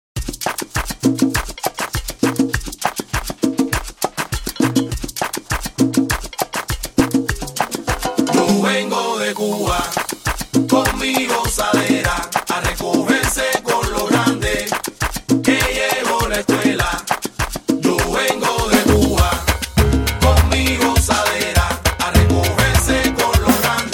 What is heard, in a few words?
SALSA INTRO BPM 101